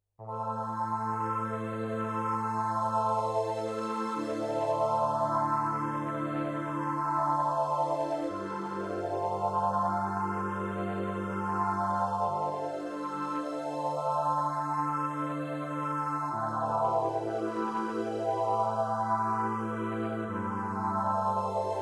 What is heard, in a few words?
Solina